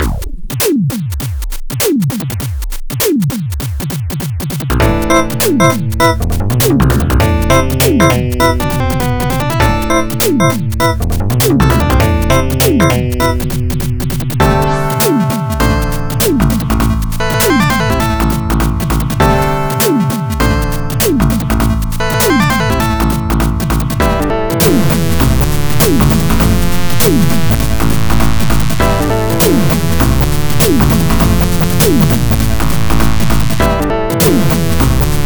beatemup jazz club

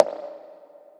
pop

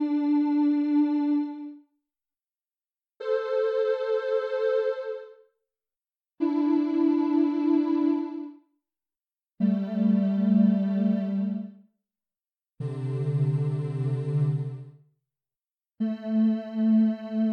ambient ish; ambient ish stuff

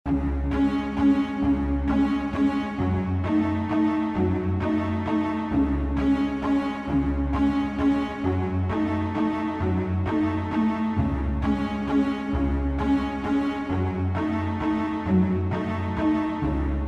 Winter waltz

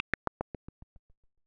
chitter

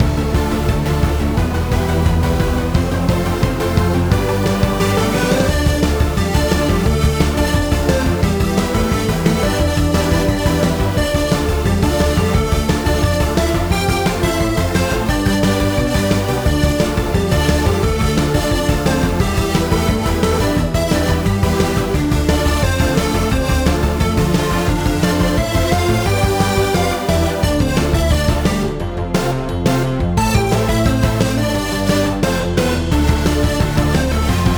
pure raceway climax bpm175

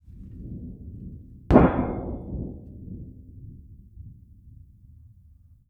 metal sheet large

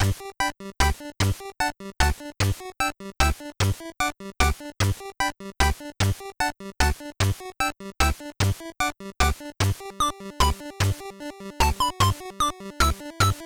annoying waltz

